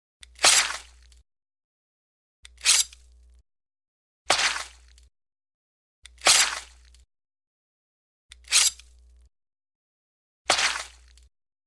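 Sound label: cut body, pull out sword and repeat; Звук мечей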